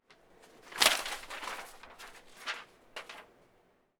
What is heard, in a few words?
Paper Toss Office